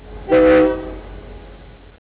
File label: train